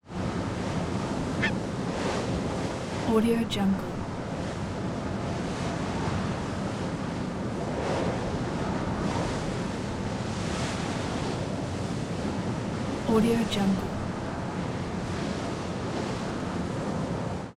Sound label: seagulls on ship ship at sea bow surges